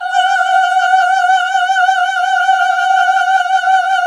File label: GOSP. AAAHH